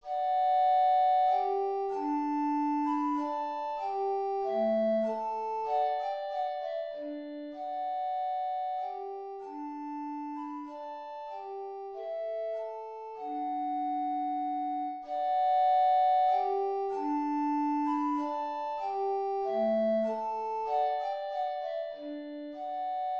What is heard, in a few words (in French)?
menuet majeur; lully jean baptiste